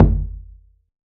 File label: Kick; Ableton Live 12 Lite; Core Library; Drums